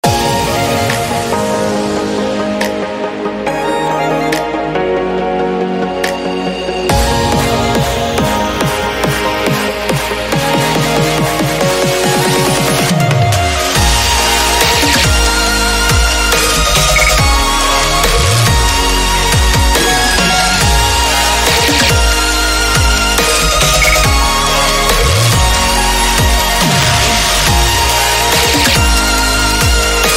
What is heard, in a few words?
Live Electronics Beat